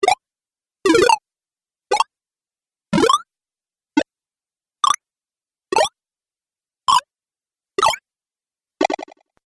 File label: Videogame Bleeps; Video Game Sound Effects